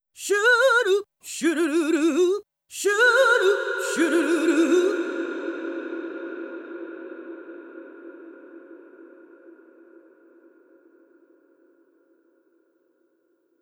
DRP5 VOX Long Rev

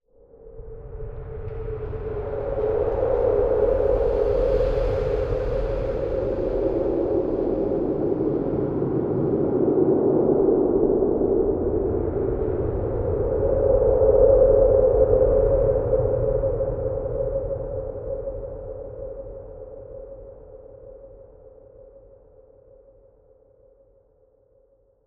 Stings Textures Nature Weather; Natural Forces

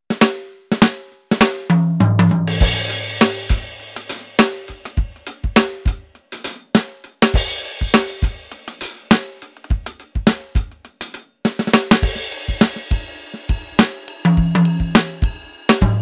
Kit 7 Maple; EFNOTE 5

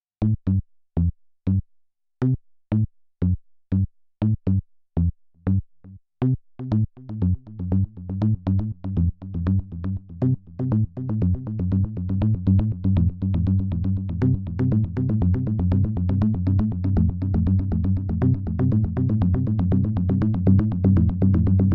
Zendelay; Ninja Tune

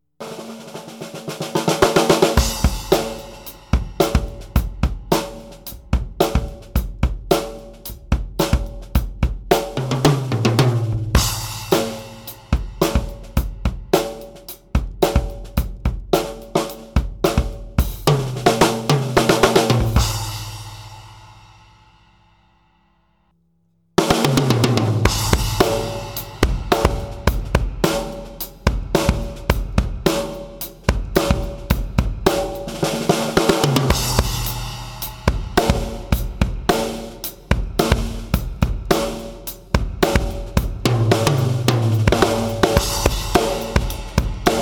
Arena Off On

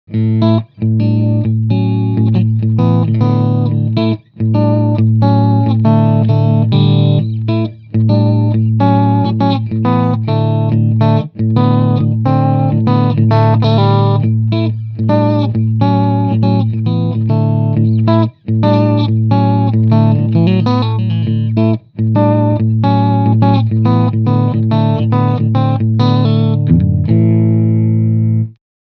Clean Channel

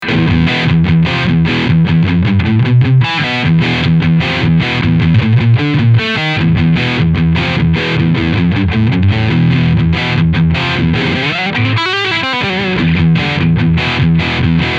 Riffsound Scooped

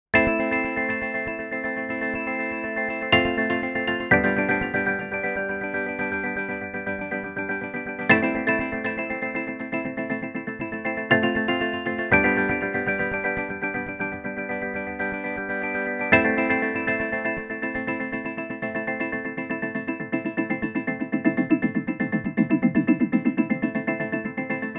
Frog Kalimba; Fireball

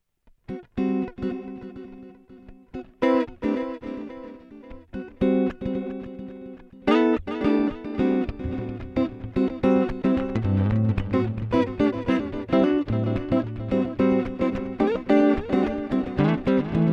stereo mod; NUX duotime

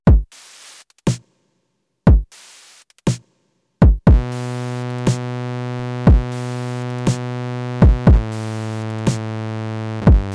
Synth Sidechain Compression; Bastl Bestie

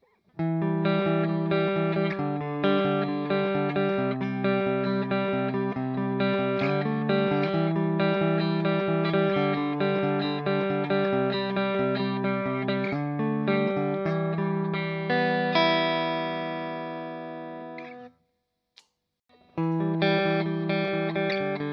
Clean Picking

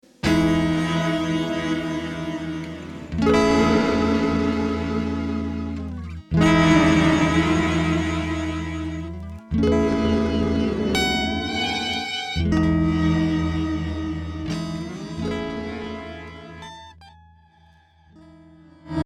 Blur guit Dimension

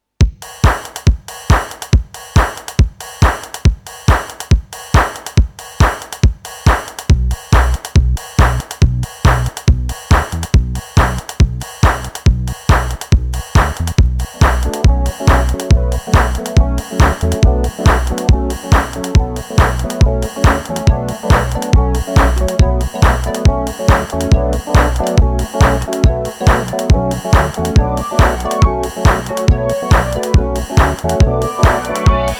Drumtraks 4 to the floor

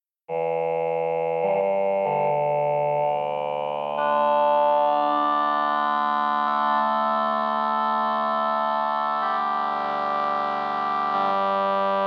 human formant shift reso

